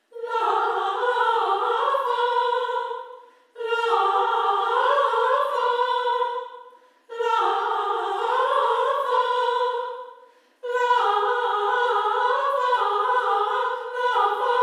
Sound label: Chamber Choir; A Plague Tale: Requiem; The Child; Estonian Philharmonic Chamber Choir